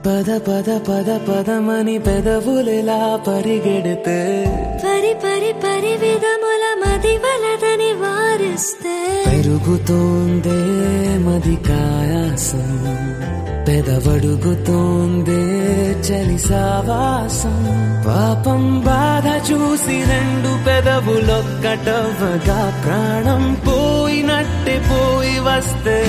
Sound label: Title Song Ringtone